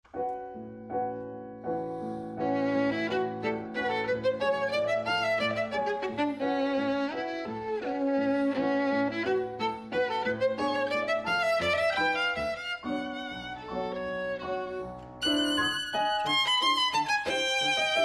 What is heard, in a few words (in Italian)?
Melodie Per Violino E Pianoforte Allegretto Leggero E Scherzand; Op. 35 Bis